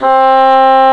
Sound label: Dulcian; instruments various; Sound Effects for Multimedia - Volume 1